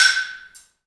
balambo, balafon; Heart of Africa

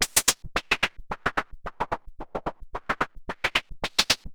MiniBrute 110BPM, Gated Seq Noise

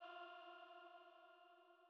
Female Pad F3

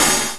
OPHIHAT, Korg ddd5 drums

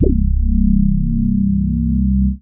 Single Electric Basses, Dance Floor Bass

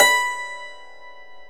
Hammer Dulcimer B3; Planet Earth; Digital Sound Factory Planet Earth

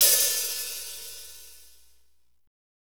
HAT, Motown Kits, Roland; Northstar - Drumscapes Roland

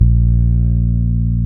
Fretless Bass

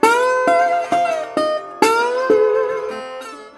Blues Guitar Samples; ILIO - Fretworks

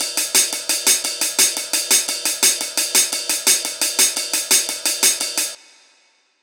PRCLP, Percussion Loops; neuro; Stanza; Drums

